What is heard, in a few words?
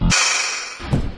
door move; source engine; hl1; video games